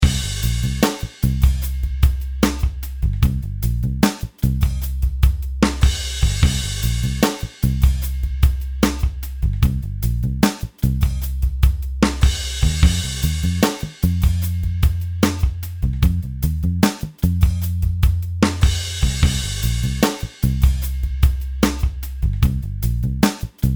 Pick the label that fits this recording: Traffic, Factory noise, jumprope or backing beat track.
backing beat track